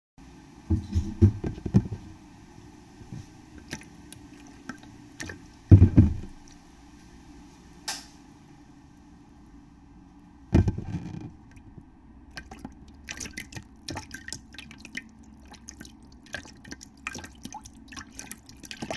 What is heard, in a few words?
Water